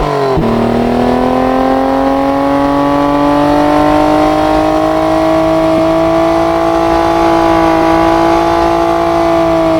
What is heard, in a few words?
atv third gear to full throttle loop; hl2